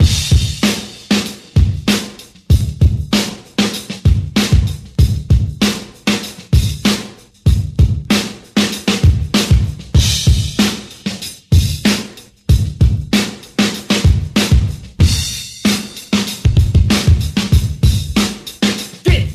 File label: steady, drum loops, funky